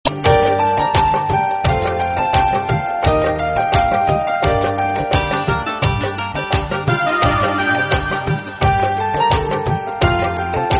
Hindi Muzik Ringtone

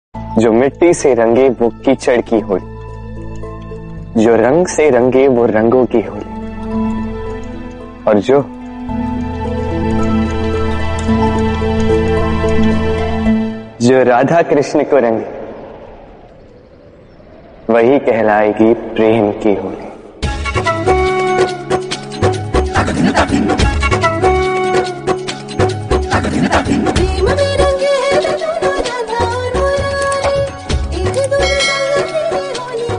Holi hindi Ringtone

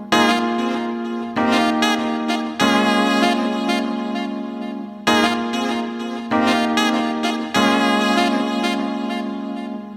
Ending Saxo; Oppo A9 (2020) Ringtone

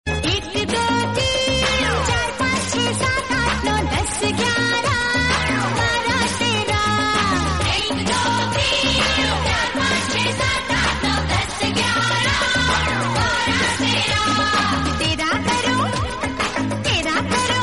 Hindi Song Ringtone

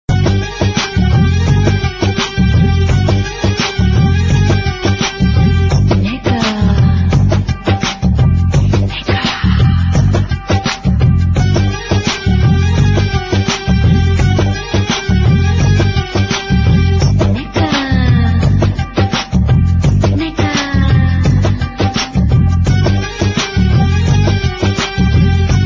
Hindi Ringtone; Komolika

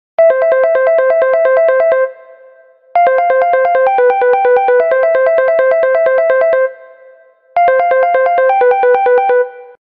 Infinix S5 Pro Ringtone

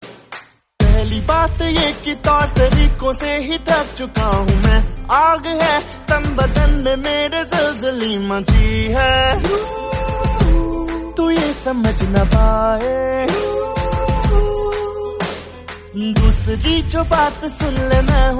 Hindi Ringtone

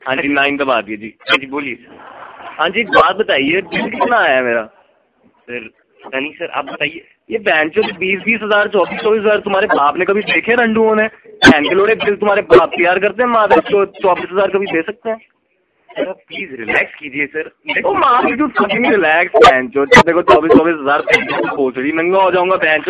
Funny Hindi Ringtone

